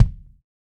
DW Red Maple Kick; Sonic Foundry (Sony Creative Software); Vital Drums

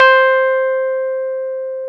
Electric Pianos; Wizoo Electric Pianos

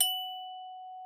TRIS F#5, VIBES; Xsample VOL-4

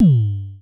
FM Kicks G2, Vermona Kick Lancet; LookIMadeAThing Kicks

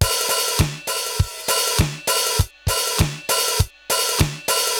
RX17 (100BPM), cyborg beats; musicradar

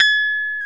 KEY MKS20 E, MKS20 + EP

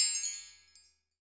BELL TREE; EdgeSounds; Drum Mashines; R-8 DRUMS

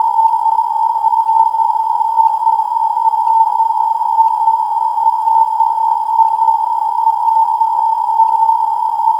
Drone Ambient; Drums & Drones

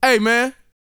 VOX, R&B Dance, HIP-HOP

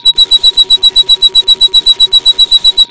ALARM FX

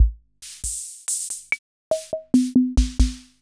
Music Box; EKO; MusicBox-12

